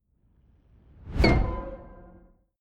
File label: metal weird flute